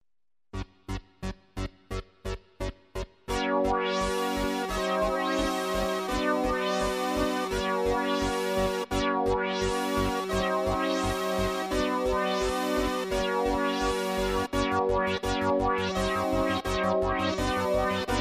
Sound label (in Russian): kosmicheskaya tehno melodiya na sintezatore